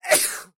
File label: sneeze